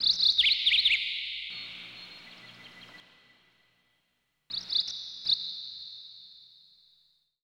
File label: AMBNT 160; Ambient